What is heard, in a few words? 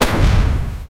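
fireball spell skill explosion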